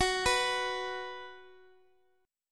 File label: B 5th F sharp, chord